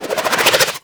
SMG Alt Projectile Deploy B; Tenno; Sundial

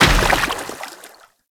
Moa Step Water; Warframe; Footsteps

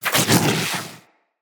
Root Spider Mother Web Shot Proj Whizz By; Animals; Spiders; Abilities

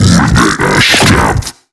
Hand Bomber Idle; Warframe; MiTW; Battle Barks

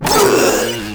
Proto Infested Runner Pain; Warframe

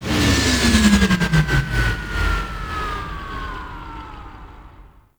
Ship Showroom Ship Arrive; Corpus Ship

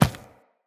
Infested Medium Run Default, Footsteps

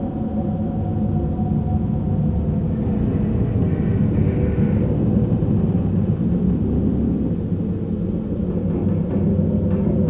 Galleon Hallway Ambience; Warframe; Grineer Galleon

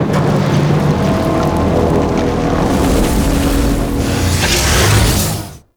Void Dragon Pillars Pre Launch; Warframe; Duviri; Movement

